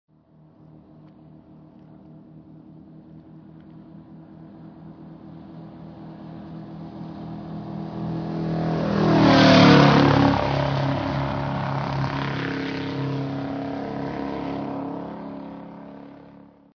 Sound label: Ocean powerboat passby